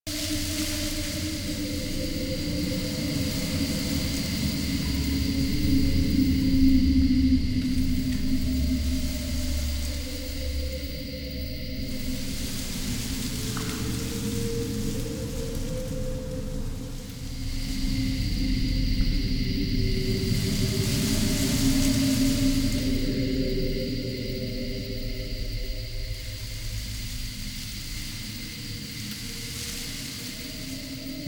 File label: Exterior Foreground Forest Scared Sad Loop; Ambience; Duviri; Warframe